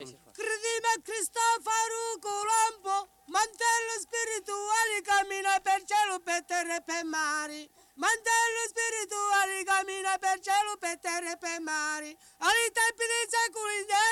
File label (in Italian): canto religioso; cristofaru culombo; Cardeto; Mammola; Cinquefrondi